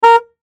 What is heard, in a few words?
Horn Short; Transportation Automobile; Car Sound Effects; Cars (Sound Effects:Vehicles); Blastwave